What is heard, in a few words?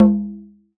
TUBANO